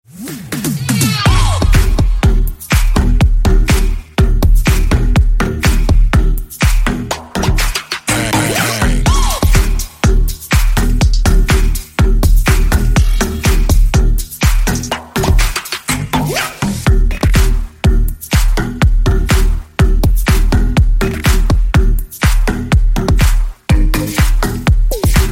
instrumental

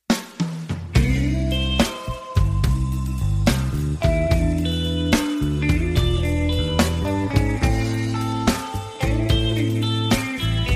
instrumental

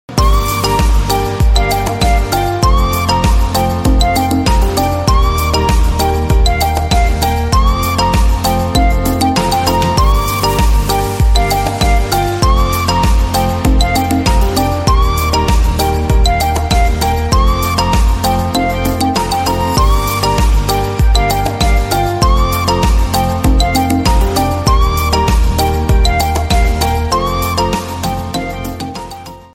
remix

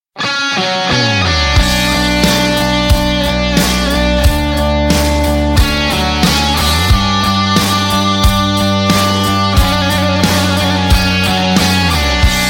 instrumental

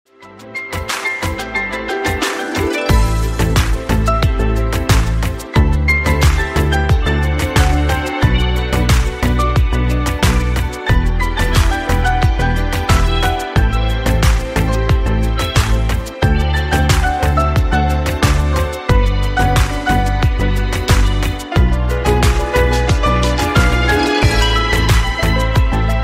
piano remix

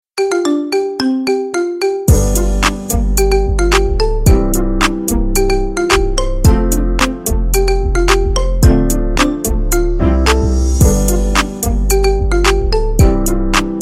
marimba remix

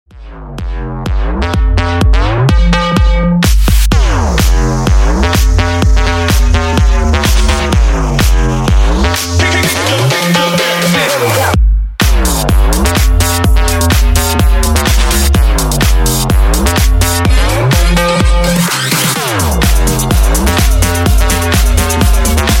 fat beat

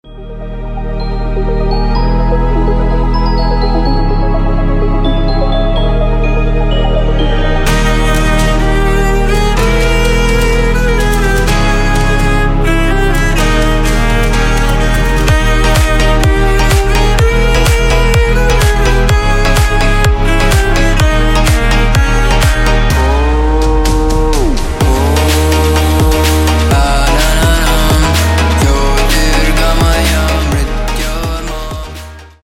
cello mantra

